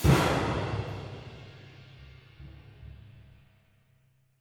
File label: Music Stingers Stinger